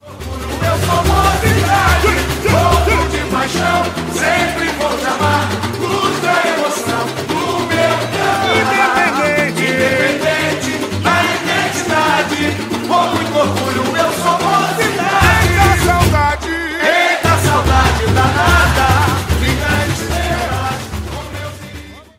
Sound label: Pernambucopulos Break